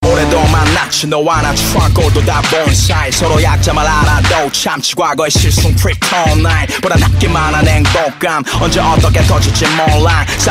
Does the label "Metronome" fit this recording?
Yes